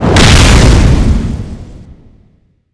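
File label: explosion, weapons; soulreaper; cstrike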